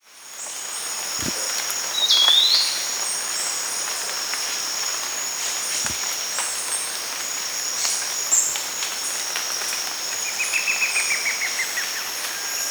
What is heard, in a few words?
forest elaenia; پرنده الینیای جنگلی